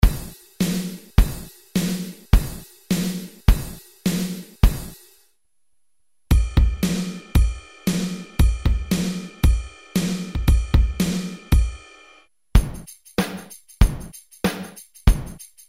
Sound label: Rock Beats